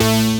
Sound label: SYN Synth; Best Service Gigapack 1